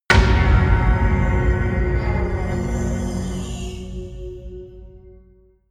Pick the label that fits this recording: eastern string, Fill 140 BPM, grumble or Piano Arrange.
eastern string